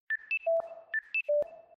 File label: battery low ringtone